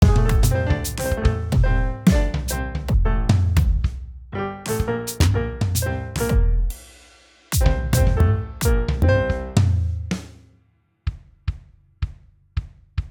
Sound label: GarageBand Midi